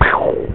POW

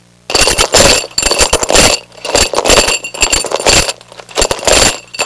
CHAIN

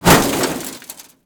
metal box impact

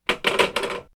phone hang up

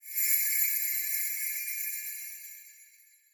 jingle bells

